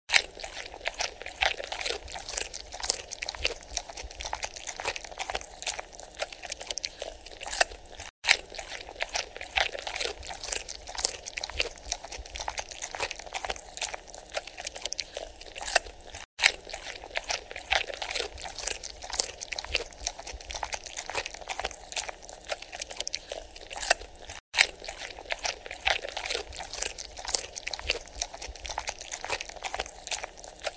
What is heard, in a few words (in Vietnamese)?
âm thanh tiếng nhai thức ăn